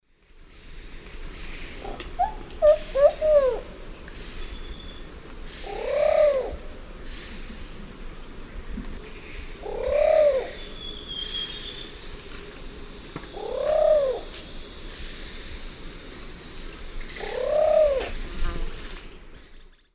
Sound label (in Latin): Patagioenas plumbea, song and call; S. America; Eastern Lowlands